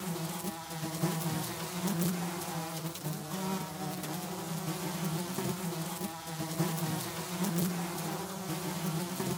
fly swarm

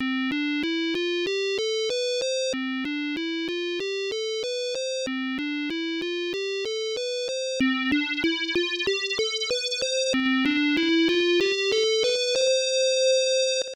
Stereo Check; viper phase 1 seibu spi system arcade

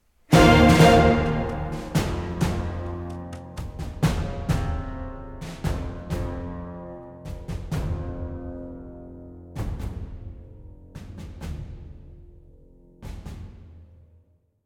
Music Battle

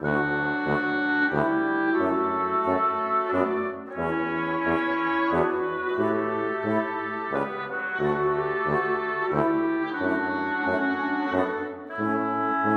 Woodwind

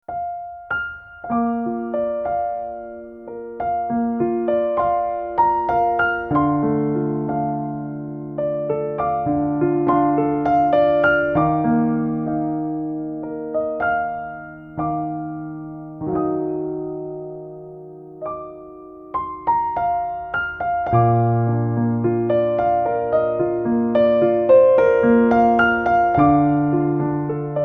Heartbeat (Piano Vers.); Impostor Factory Original Soundtrack 2021

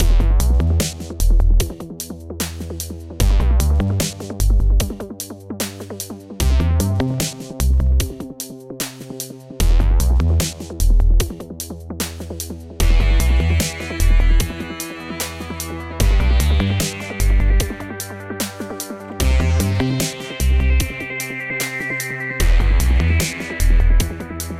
Helicopter Escape; H.M.S Orca; The Escapists 2 Original Soundtrack gamerip 2017

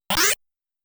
Sword Drawing; The Tower of Druaga

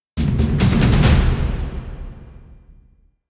Fist Fight Outro; The Witcher 3 Wild Hunt

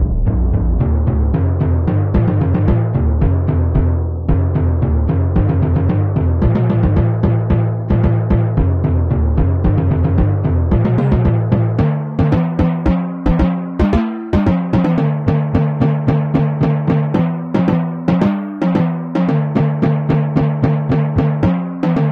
Drum; Rhyme of Duotime; The Bard's Tale Remastered Trilogy

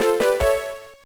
Jingle; bb ball; gba

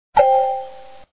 SE Notification; Shop Empire

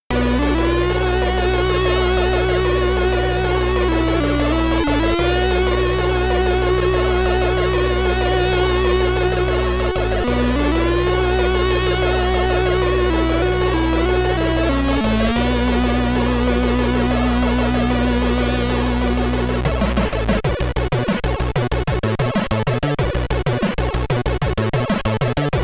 SID Stereo; brain artifice; commodore 64

